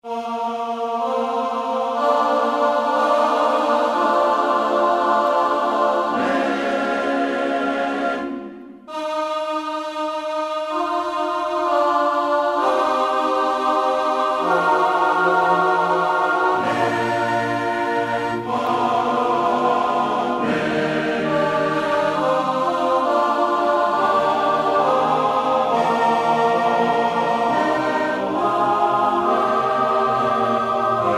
Amen Selections, Alesis Z3 Sanctuary; Factory Demo songs

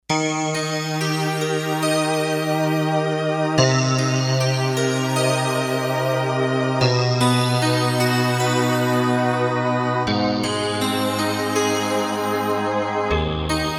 Bi-Iltis, Kawai K5000S factory presets

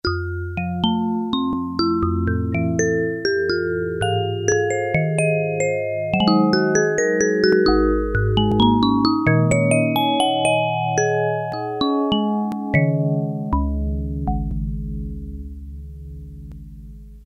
Great Vibes, Yamaha TX81Z

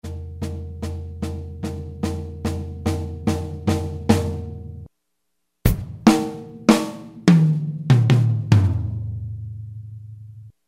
74 bpm, Yamaha A4000 Real Drums Loops

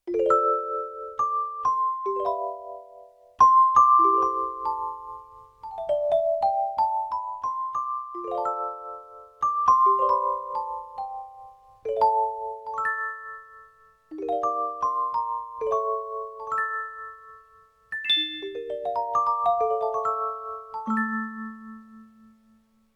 Tremolo Celesta, Yamaha TX16W

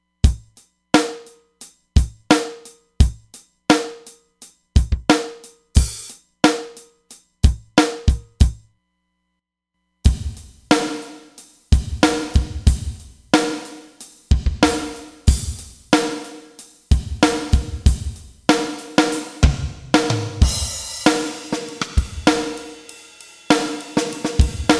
Bright Room, Roland DEP-5; Factory Patches

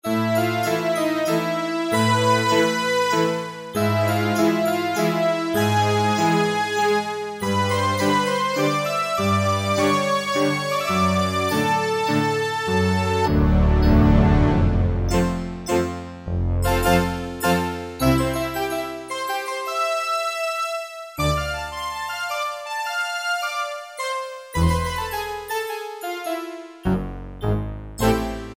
SOFT ORCHEST, Casio VZ-10M; Internal factory combi

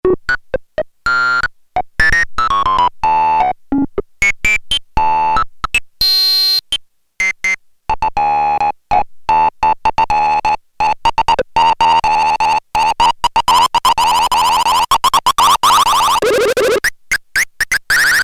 Percolating Grunge; Sequential Circuits Prophet-5 (Ver.3); Factory Patch; File 3 Programs

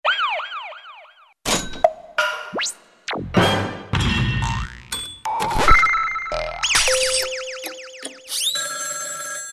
F.X.; Latin And F.X. Percussions; Roland SN-U110-02

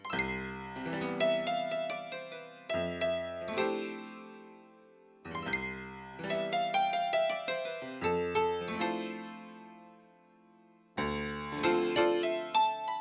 Spread Field Piano; Yamaha TX16W; Yamaha CFIII Grand Piano

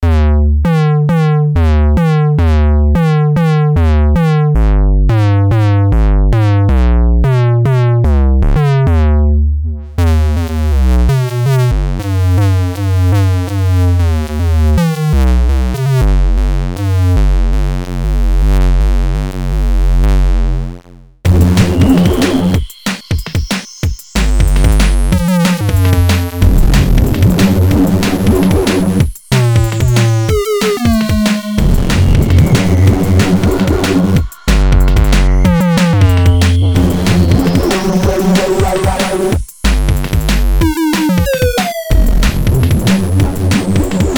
Digital Bass, Korg Radias-R

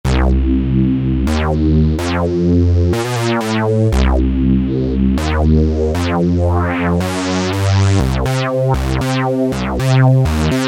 Bass, Alesis Micron; Hacker Bass; Factory Programs

